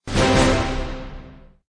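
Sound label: UI Reward Item; Blue Guardians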